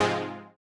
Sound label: Housey Stab G#2; KG MegaSaur